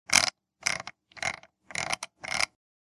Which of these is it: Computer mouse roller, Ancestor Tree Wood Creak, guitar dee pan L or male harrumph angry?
Computer mouse roller